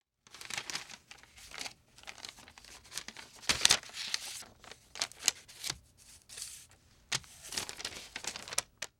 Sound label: Foley Paper Unfold